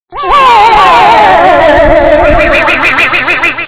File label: duck bills